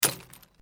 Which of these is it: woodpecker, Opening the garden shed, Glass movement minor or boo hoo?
Glass movement minor